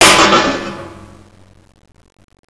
bust metal, debris

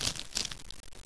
player duct

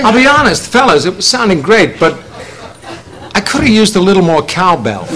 cowbell

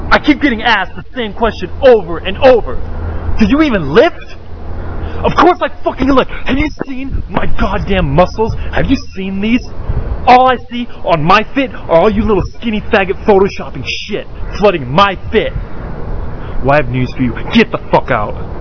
lift

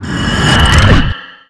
weapon respawn

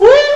whoop

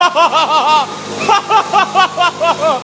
hahahaha hahahahaha

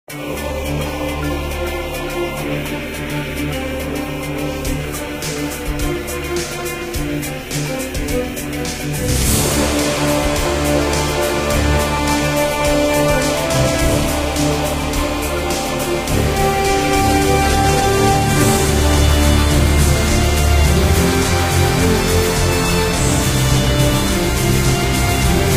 orchestra; sven; platoon; questionable

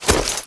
870 draw

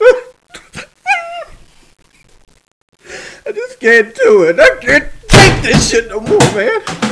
cry

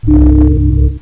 win 3.0

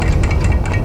heavy open

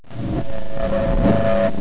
lever

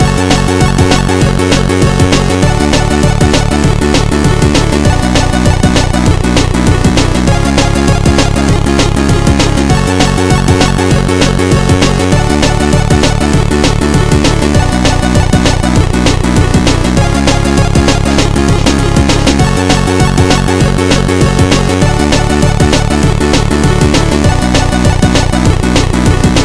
chiptune

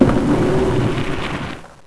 button

